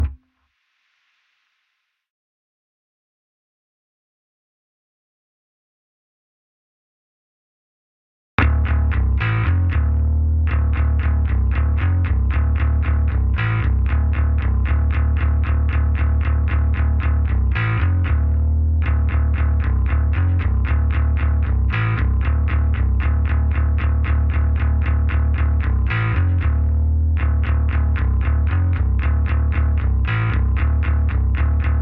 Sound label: Bass; nowhere station; Instrumental